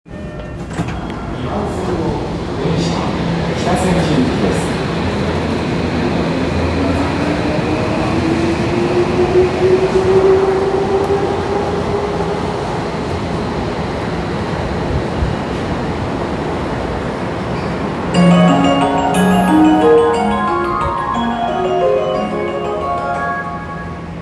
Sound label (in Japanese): 日比谷線八丁堀北, train sounds